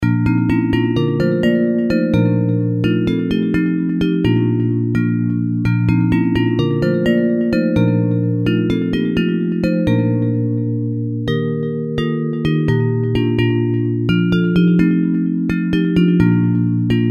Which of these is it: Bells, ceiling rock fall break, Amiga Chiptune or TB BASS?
Bells